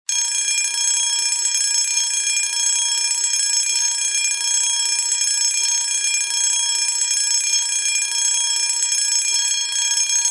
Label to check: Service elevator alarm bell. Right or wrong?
Right